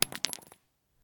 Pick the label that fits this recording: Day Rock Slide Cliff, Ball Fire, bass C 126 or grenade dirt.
grenade dirt